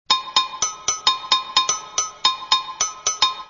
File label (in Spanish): cencerro